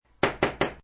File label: Ping Pong Racquet Table, Ping pong player quickly hits table three times before serving